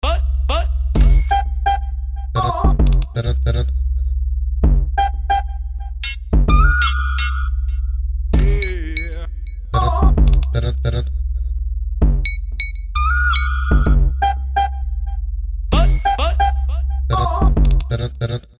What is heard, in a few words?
BEAT